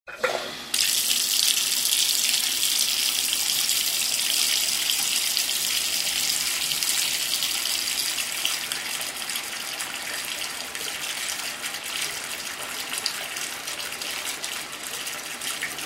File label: bath filling; water